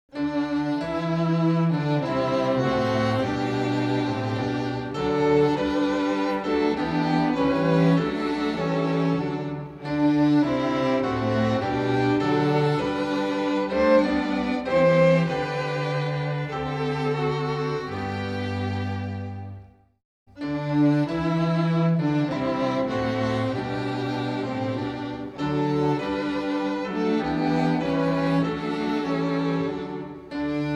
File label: music only; thy servants are prepared